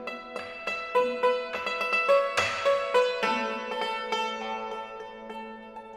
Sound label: Yangqin